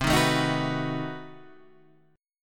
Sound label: B 7th C; B7/C strummed; JGuitar